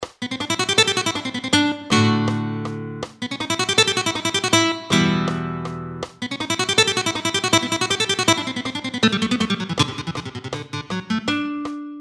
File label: Picado